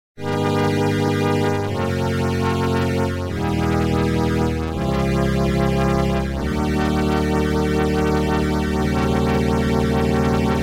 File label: string clean